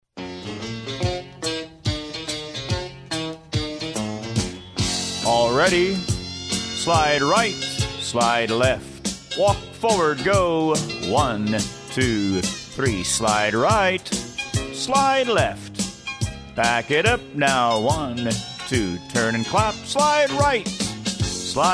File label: vocal sample